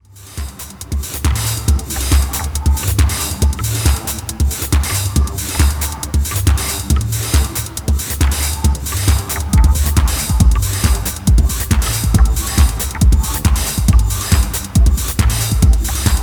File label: Disappointment Instrumental